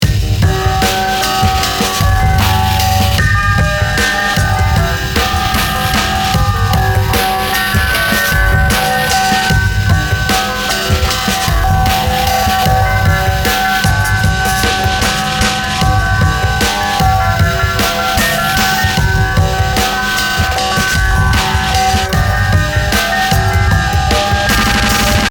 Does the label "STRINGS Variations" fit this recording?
Yes